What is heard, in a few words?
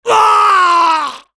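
pain critical death, French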